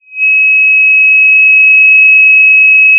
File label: pop, sound of a tea kettle whistling